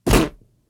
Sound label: Blow Pipe Assassin